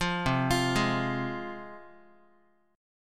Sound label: C Suspended 4th Sharp 5th, C, down, Guitar; Csus4#5 arpeggiated; JGuitar